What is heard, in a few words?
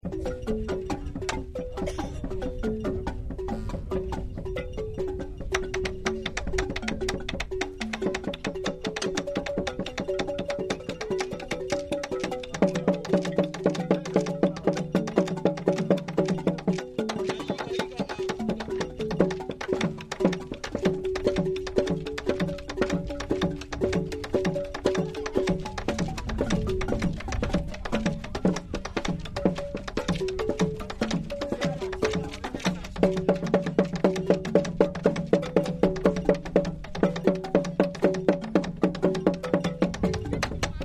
gyil, ghana lobi